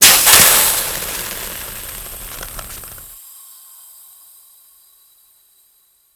Death Gas Burst; Warframe; Grineer

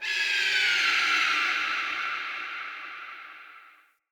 Day Bird Of Prey, Ambience

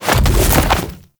Infested Splitter Jump Launch; Warframe; Grey Strain

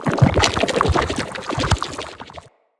Corrupted Slug Foley Move; Creatures; Movement

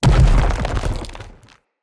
Arena Rock Fall Impact; Bromius; Abilities

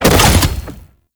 Wall Climb Dodge End Default; Warframe; Oraxia

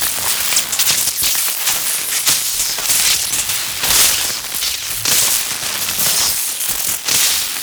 Pre Death Sparks Loop; Enemies; Gameplay; Lasrian Tank; Warframe

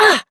Pain, Adult Operator; New War

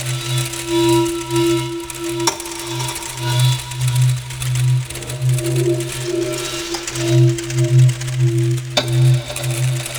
Interior Clock Loop; Ambience; Props; Zariman; Warframe; Duviri

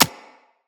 Bullet Impact Generic

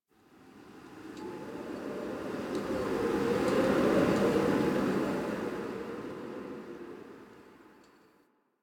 Exterior Winter Wind One Off

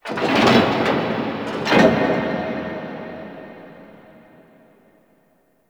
Guild mid mech, Ambience; Warframe; Misc Remaster

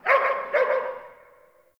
Distant Ambient Dog Bark; Warframe; Ambience; Nineteen Ninety Nine

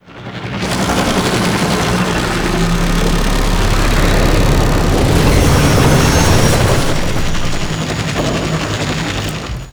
Dropship Close; Narmer Outpost

